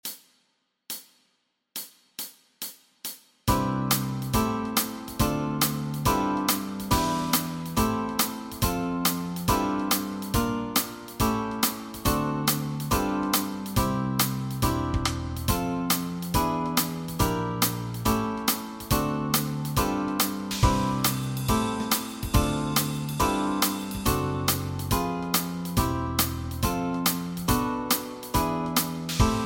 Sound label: Backing Track, walking bass